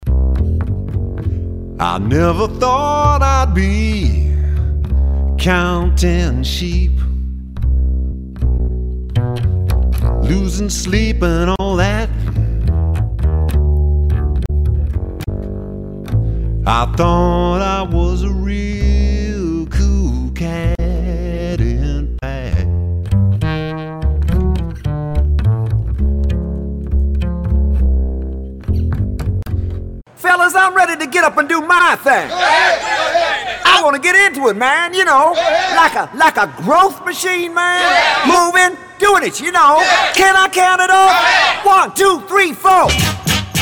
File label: jingle